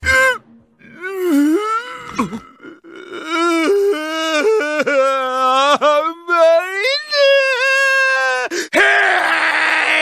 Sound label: crying; esidisi